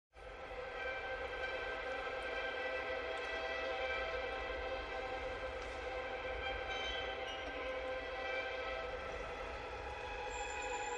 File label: High Strings Only